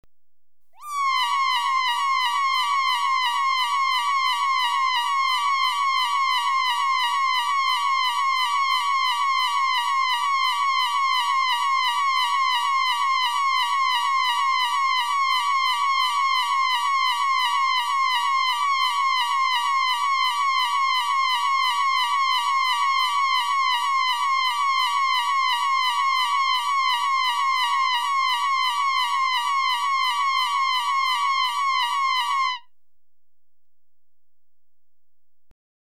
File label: Mewing Kitten Alarm, Electronically Generated Sounds; BBC Sound Effects Library